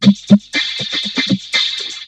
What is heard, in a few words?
VOCO LOOP; Syntec; Wall of Sounds; TECHNO-BOX